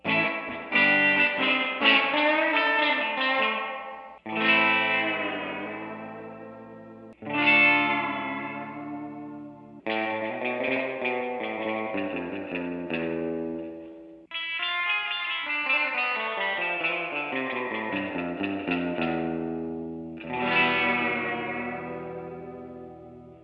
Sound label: Reverb Test